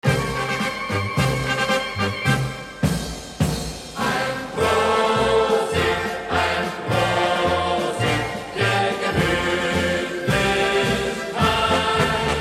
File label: German Music Oktoberfest; Ein Prosit